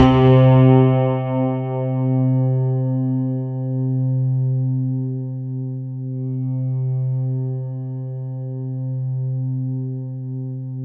C2, synth; 90 s Sample CDs; NiceBeats; SynthLine VOL-27

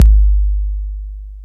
TR-808 Kick

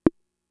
Conga, Fricke MFB-522

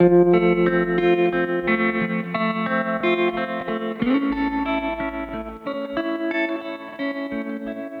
Trem Guitar, 70s Analog Funk; Sonic Foundry (Sony Creative Software); What it Is!

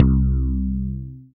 BAS TX-802; Best Service Gigapack 2

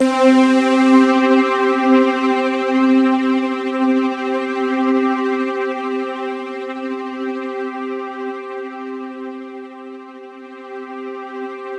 C3, Roland JV 2080 CLUB ORGAN

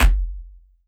Elektron Monomachine, Drum Machines

